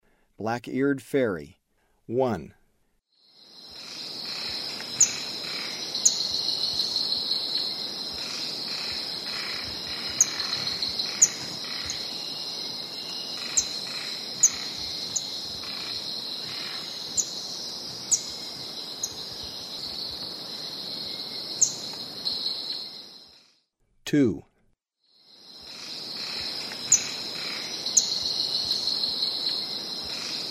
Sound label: Black-eared Fairy; S. America; Eastern Lowlands